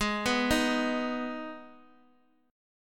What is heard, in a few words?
G sharp Minor G sharp down Guitar DADGAD, chord